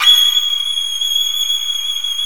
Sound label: stereo solo violin; E-MU Producer Series Vol. 2 – More Studio Essentials; Composer